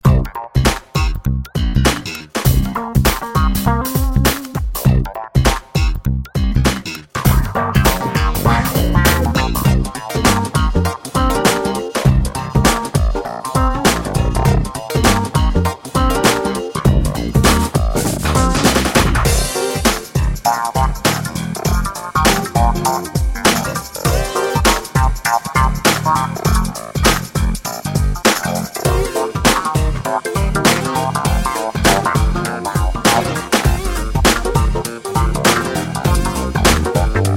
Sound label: WeirdMusic; ps vita; ps3; Doki Doki Universe; ps4